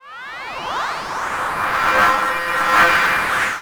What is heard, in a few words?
Cwejman S1 - Mirror Steam